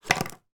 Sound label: stock cubes remove from box on to table